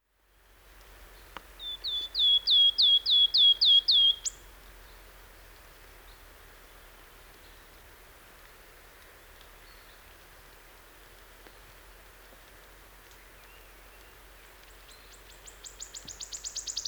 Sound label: Wood Warbler, birdsong